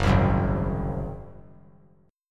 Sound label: Gb Suspended 2nd Flat 5th 3C,3F,3A,3D; Gbsus2b5 strummed; 6,7,9,6